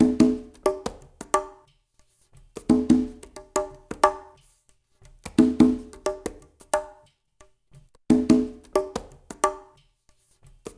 SWT CONGAS, SWINGTOMS; Spectrasonics; Liquid Grooves 1 & 2

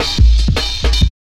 Klanga Cut, drum loops 165.5; Jungle; Zero-G Jungle Warfare 1, 2 & 3; 90 s Sample CDs

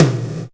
Gated Kit, Percussion

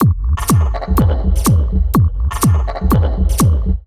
A# 124bpm, House Drumloops; Zero-G House Fabrik Drumloops

